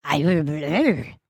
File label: Stunned; Lego Strombie; creatures organic